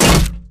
bullet heavyballistic titan; Titanfall 2

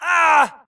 grunt death quick; Titanfall 2

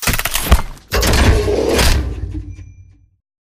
stand reload; Titanfall 2

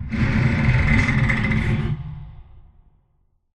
crane move stop metal stress; Titanfall 2

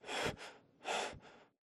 crawl diag grunt; Titanfall 2

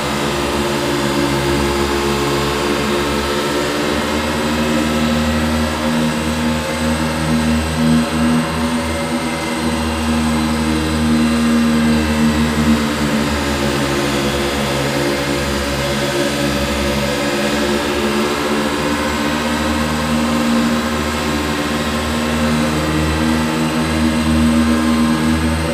giant fan scifi turbine; Titanfall 2

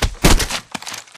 spectre zombie drag grunt; Titanfall 2

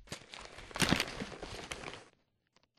mvmt; Titanfall 2

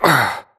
grunt hits; Titanfall 2

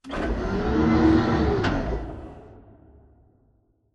Robot Arm Pass Receive Approach Close; Boomtown; Titanfall 2

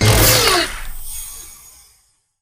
drone target lost; Titanfall 2

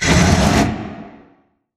sliding door sm verb close slide; beacon; Titanfall 2

